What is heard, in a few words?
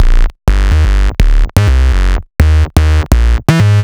Distorted Phunk, Bass; Sonic Foundry (Sony Creative Software); Underground UK House & Electro